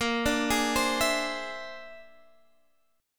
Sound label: A sharp 9th Flat 5th A sharp, Guitar, Open G